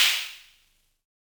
Snare, Univox SR-95

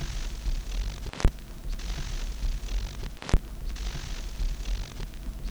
Crackle Loop, Vinyl Noise; noise hiss crackle

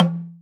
Percussions

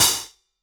Solid Bruiser Open, Hats; Airwindows

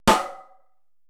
PERC MIX

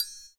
CYM, Ethno Techno; ILIO - Ethno Techno

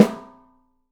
OPEN PICOLO, 3D Drums

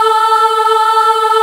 choir

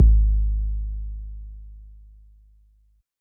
BD Long, Yamaha DJX 2B

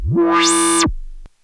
Monotron Sci-Fi

